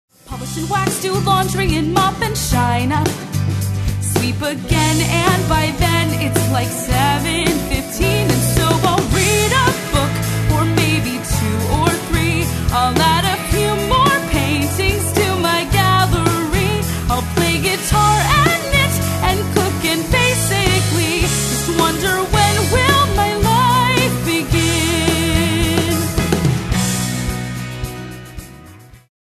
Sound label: karaoke vocal; princess; disney